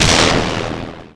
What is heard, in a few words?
pain; vortex; shockwave